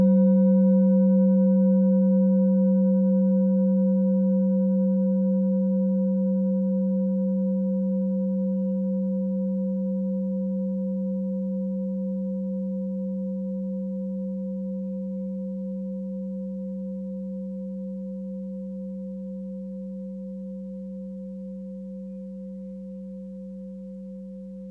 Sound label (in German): klangschale orissa